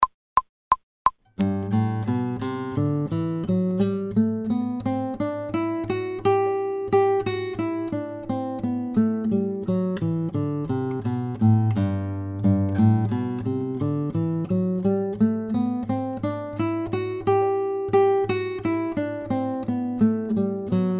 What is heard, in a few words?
G Major Scale two octaves fast, guitar; without metronome